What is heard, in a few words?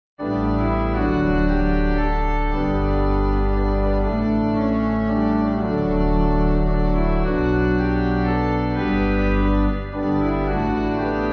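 Pipe Organ, Music Backing Files for Churches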